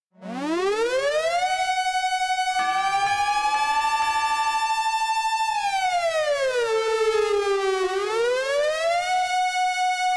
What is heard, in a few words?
fire engines